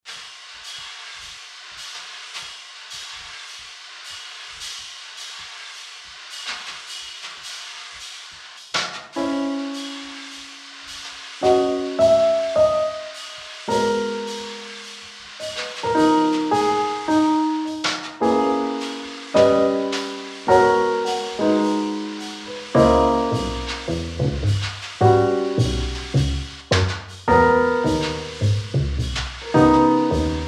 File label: virtual quartet